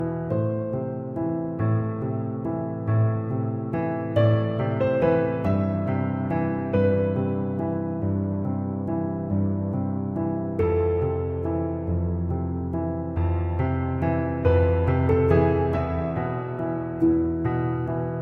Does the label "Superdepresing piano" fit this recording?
Yes